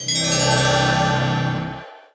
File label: heal